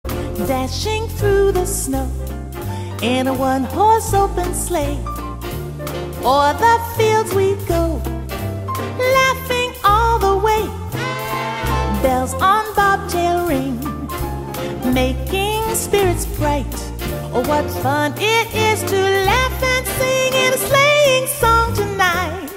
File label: Jingle Bells